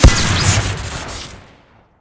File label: grenadier shoot crit, weapons; tf